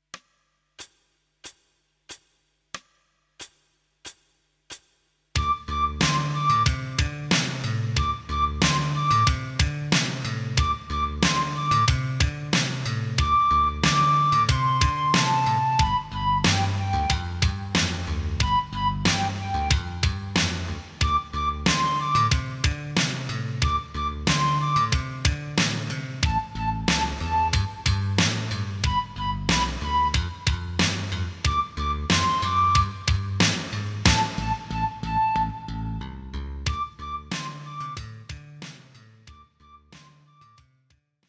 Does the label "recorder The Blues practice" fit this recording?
Yes